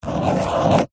office pen ballpoint paper hollow table draw circle; zapsplat